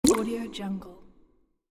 cavern water; bogdansfx

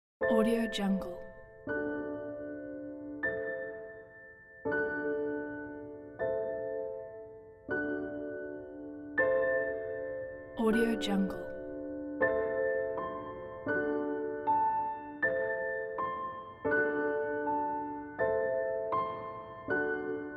rainy piano

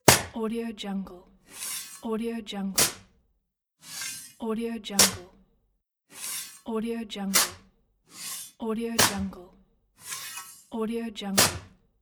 digging in sand

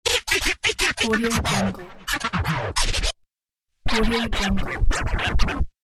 vinyl scratch

